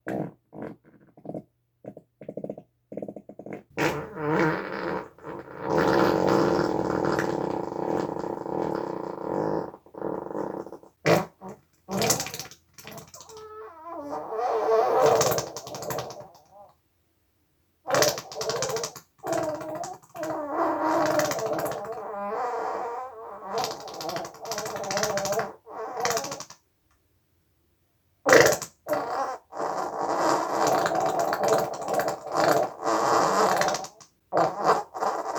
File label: FARTS FOR ANIMATION